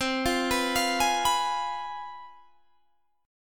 C Major 7th Suspended 4th Sharp 5th, C, down, Guitar, Open G